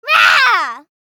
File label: Little girl angry shout